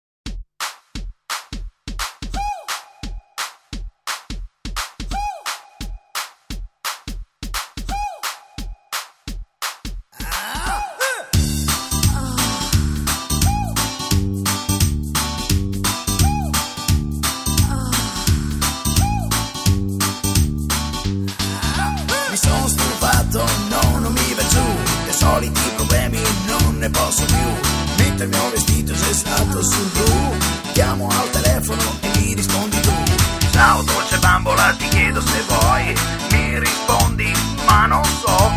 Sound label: mambo